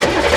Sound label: MOTOR IN; SIMUZENSOUND